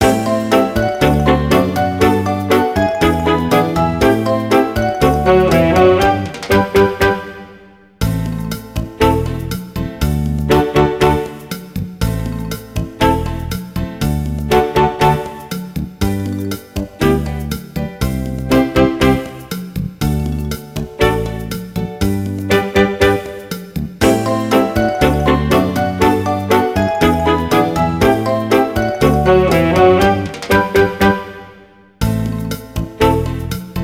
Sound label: Instrumental